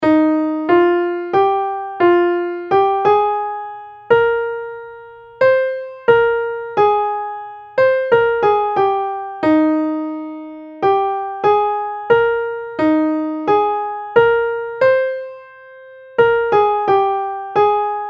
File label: Melody; Practice Exam Example 2